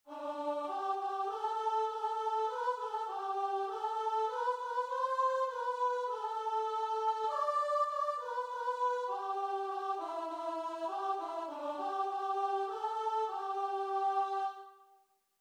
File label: guitar and vocal